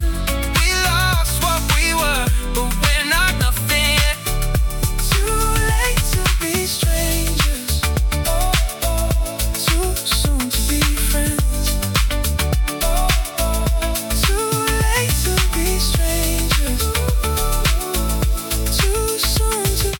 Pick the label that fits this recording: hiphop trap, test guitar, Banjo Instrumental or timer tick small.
hiphop trap